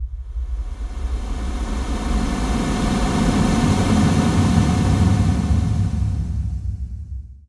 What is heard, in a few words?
128BPM Kick FX; FX Fills; 4Bars; Vengeance Samples